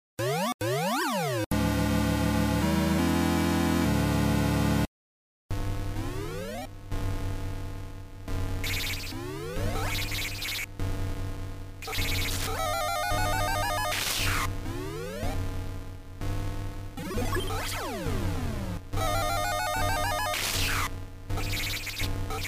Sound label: gun